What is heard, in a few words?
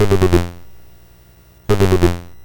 BASIC sid MOS6581R4, GAMES; Sword of Fargoal